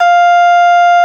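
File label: Troubadour Harp C3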